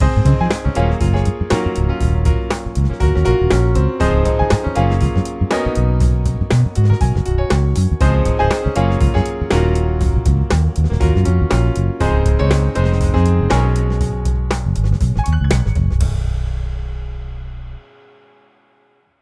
Drive time, music beds, affects and jingles

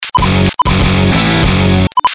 Classic Beepy, Stabs & Bursts; Best Of N2O Library

